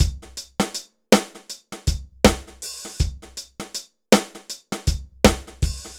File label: dry Loop BPM 80 Dry, AKAI S6000